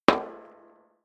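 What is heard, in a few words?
step dress boots metal rattle single left, footsteps; schritte; menschen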